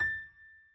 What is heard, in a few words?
key; keySounds